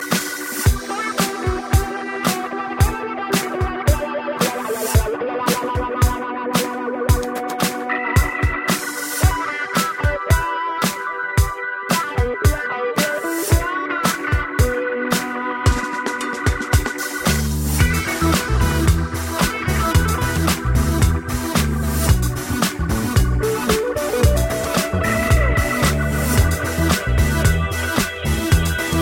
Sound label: Gitar